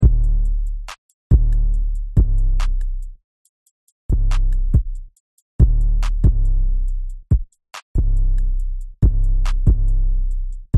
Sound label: loop beat bass 808 Hard